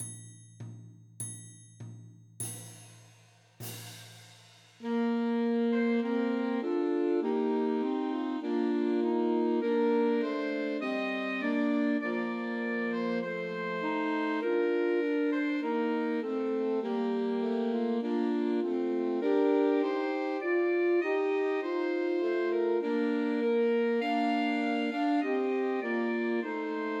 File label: See Amid the Winters Snow Christmas Trios for Three Alto Saxophones